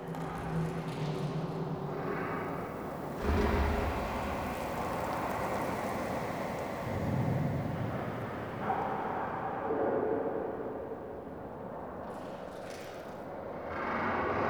Under City Huge Open Cavern Port Ambience Loop, Dungeons; Beds